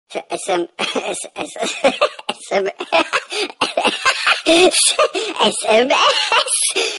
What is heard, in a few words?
laughing sms message ringtone